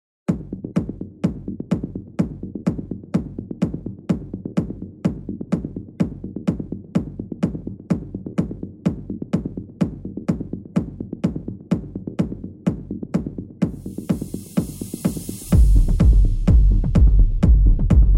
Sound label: techno